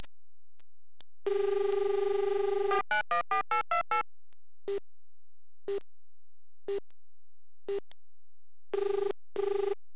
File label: call forward